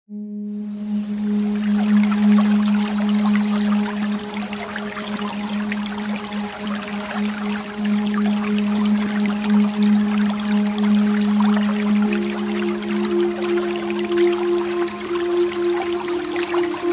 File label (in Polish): Kojące dźwięki przyrody